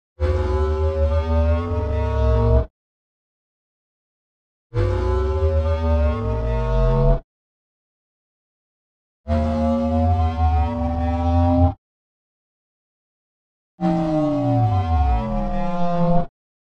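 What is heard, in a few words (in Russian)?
иностранцы рев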